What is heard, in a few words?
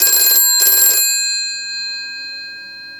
ring; gpo746